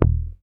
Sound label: HOFNER MUTE D#1, Bass; Sampleheads; Will Lee Bass Library